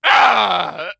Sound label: pain zombie; cstrike